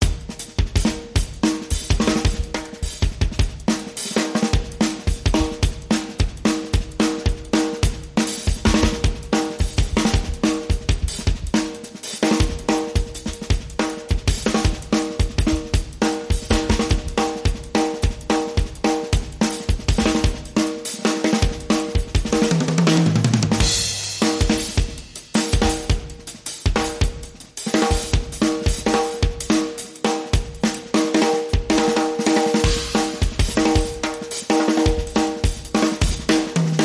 Drums Only

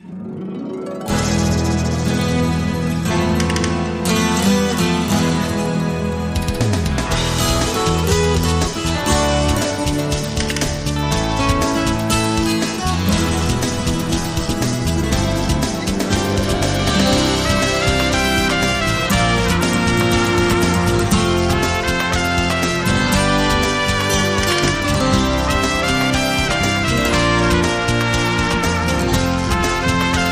Castanet Swirl

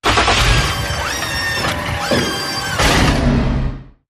Sting Transforming

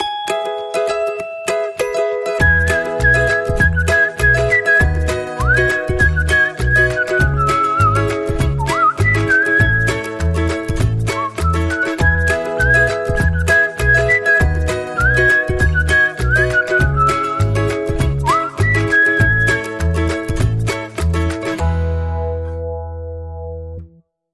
Whistling

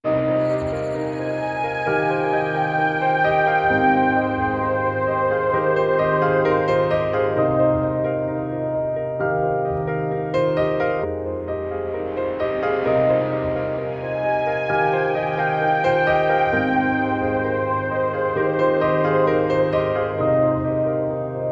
Ambient

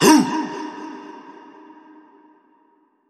Vocals Bellow Shout

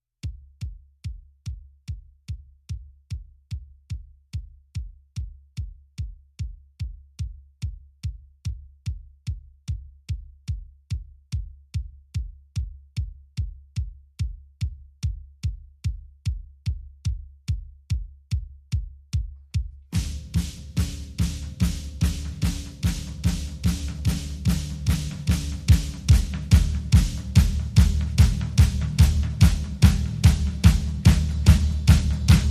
Drums and Bass Mix

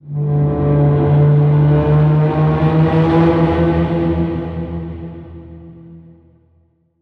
Raiders Deep Low Bend

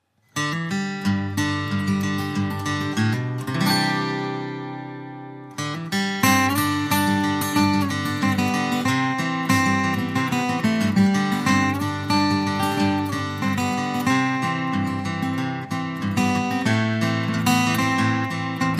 No Bass

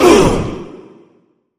Workout Grunt

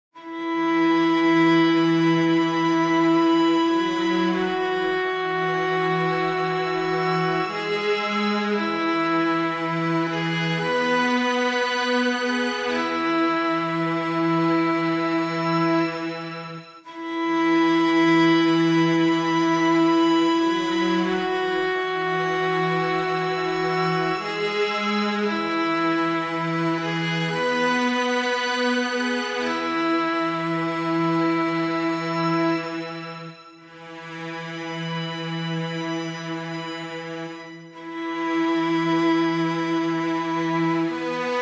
Strings Only

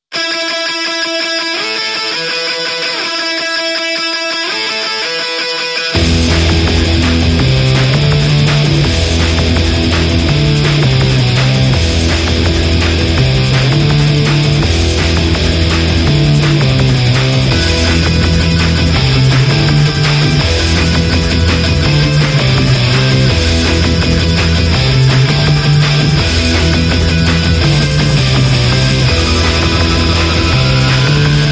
Fast Step

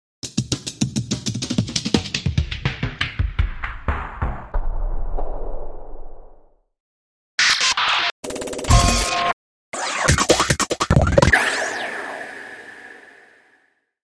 Fast Doppler Release Cut Thru And Out

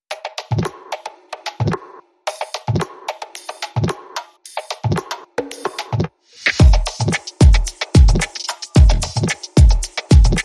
Beat Forward Funk

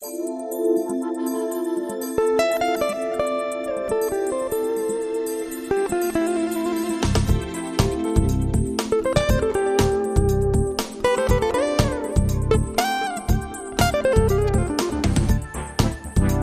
Funky Groove

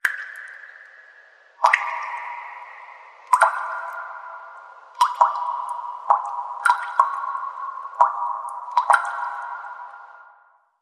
Dripping Cave Water; Red Dawn

